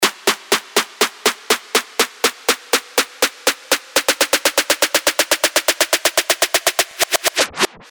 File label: SNARE ROLL RISE 122BPM